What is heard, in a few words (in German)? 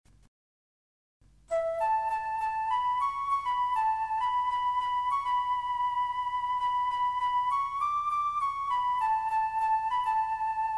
kraeutersong